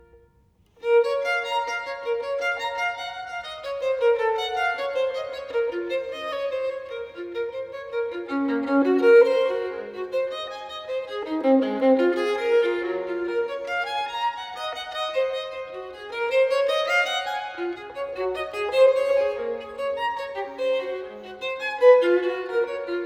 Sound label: Partita in B minor, Violin Solo